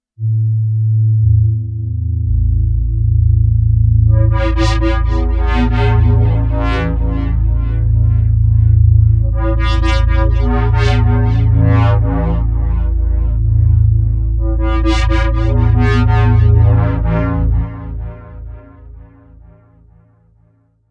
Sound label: Monster Drone, KORG Node